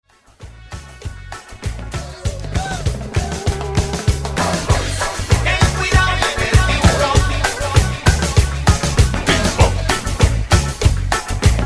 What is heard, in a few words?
Samba Cha Cha Showdance